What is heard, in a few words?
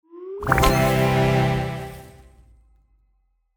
Slider Reveal Answer Correct; Kahoot